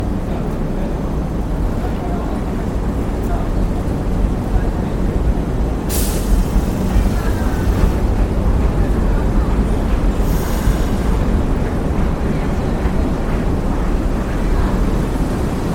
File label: rail starting